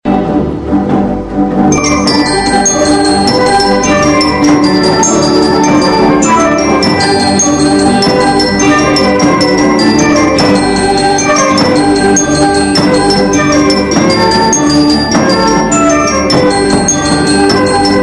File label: Calm Irish